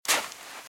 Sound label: Footsteps - Sand - Dry - Walk - Left; RadwinIsland